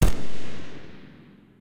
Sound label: shoot; Alawar; Crusaders Of Space 2